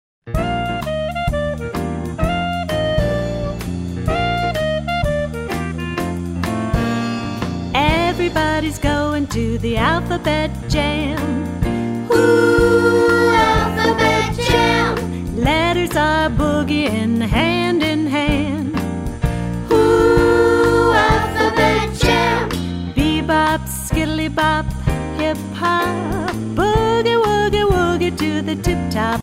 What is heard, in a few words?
Alphabet Jam